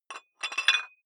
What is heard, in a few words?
dumbbell thread put on small weight quick, fitnessstudio